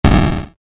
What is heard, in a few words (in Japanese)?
爆発 レトロ